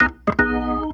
Organ Lick; Ambient Grooves; Organs Licks

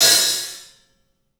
HAT, CLUBHAT, 3D Drums; 3D Sound

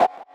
Blipp; Effects; Electronisounds

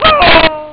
fall cry; Amiga Soundtracker sample

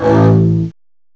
ACC BASS; M8; CMI Factory

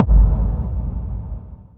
Boom; Sonic Foundry (Sony Creative Software); Afterhours EDM

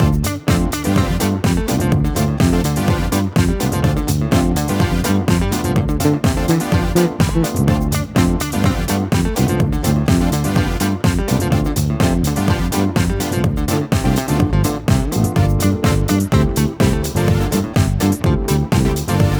Full Mix, Speedy 125bpm Dm, Nu Disco; Zero-G; Construction Kits

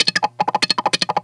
Wah Gtr 96 Up; Mo' Phatt; Digital Sound Factory Mo' Phatt

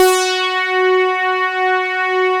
OB FILTER, OB Synths; E-MU Formula 4000 Series Vol. 3; Analog Odyssey

